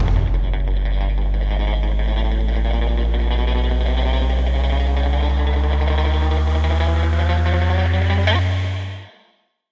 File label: near Win; netent; wildwater